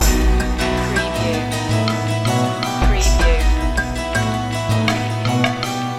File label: indias charm loop